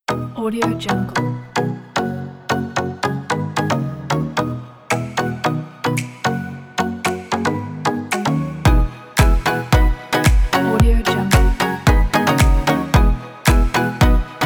funny modern cook

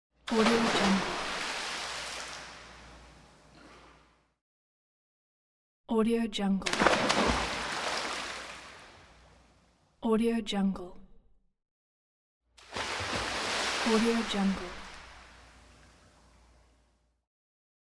a splash of water

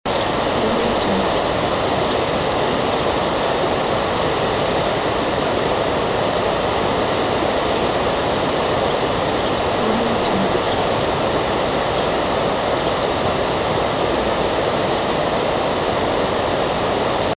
waterfall and birds loop; dedalsounds

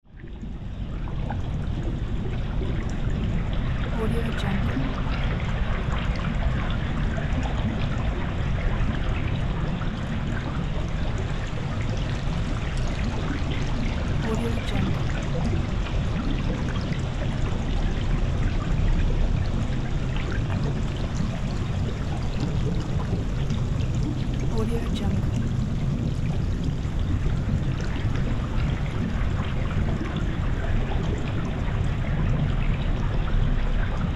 underwater

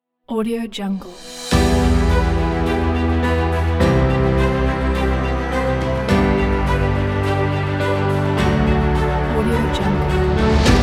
inspiring trailer

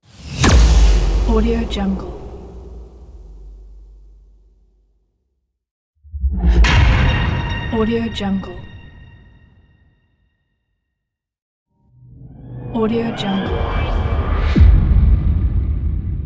film transitions sound pack

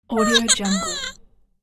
suburban gremlin

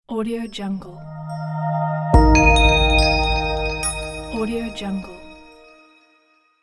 bells logo

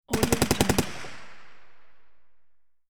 machine gun; juicycorp

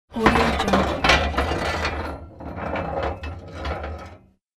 scrap metal noise

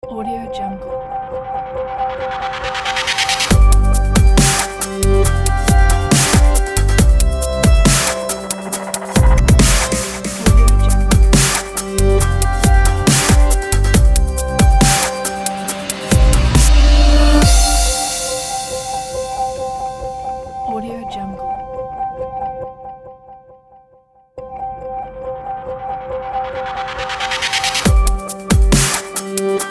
corporate dub intro

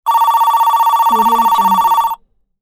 telephone beeping tone

